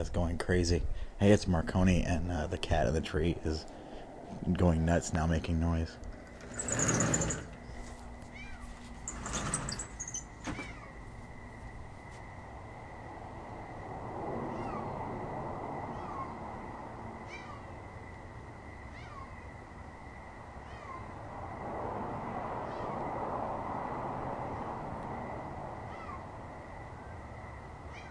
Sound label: tree kitty meowing